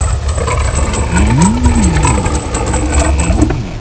lower gate; usa

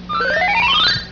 buzzin

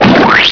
splut; usa

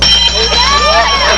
bzzz bell

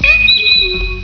time up; usa

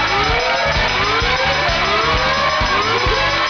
alarm; mexico

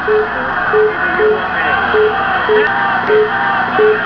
wheel; usa

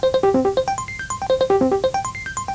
key flashing

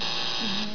buzzer

